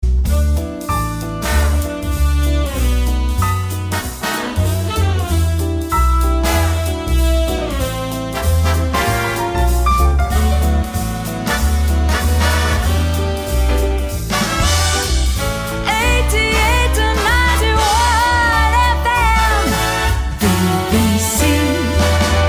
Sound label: Radio Jazz, Radio style ads intros sweeps & jingles